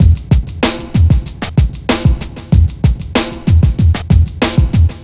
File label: ELECTRO HIP-HOP